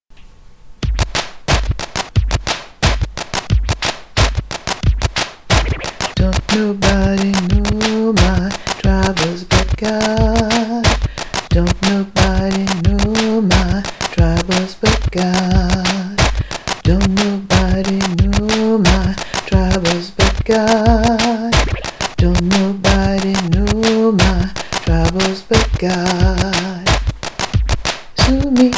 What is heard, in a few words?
TENOR